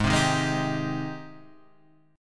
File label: Ab Major 7th Ab down Guitar Half Step Down; AbM7